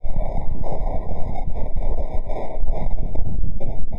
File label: Crumple Zone, Environments; Sonic Foundry (Sony Creative Software); Myths of Technology